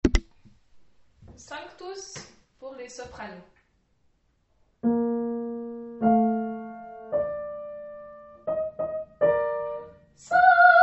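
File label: Haydn sanctus soprano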